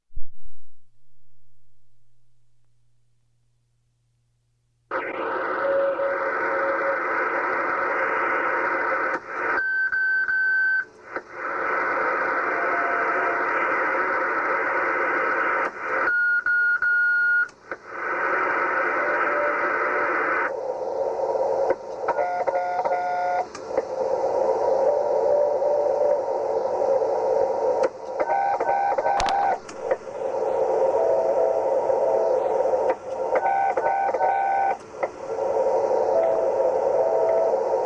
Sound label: echo